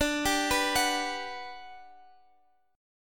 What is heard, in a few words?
G Major 7th, D